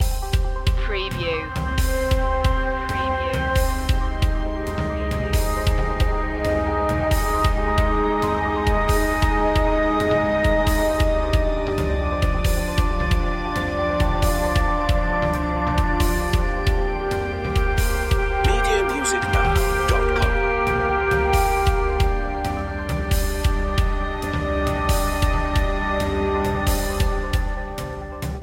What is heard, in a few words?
tones loop